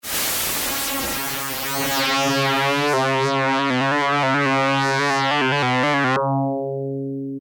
WASP STING, Yamaha DX7

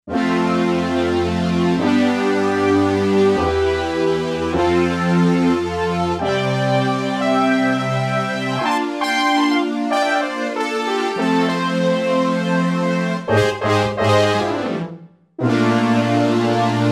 OB Stack, Roland SC-880; Factory Patches

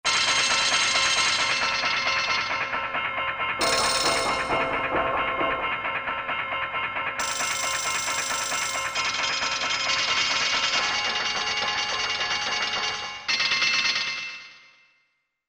horror, Percussion; Korg MSC-8S Percussion; 6Amhorror

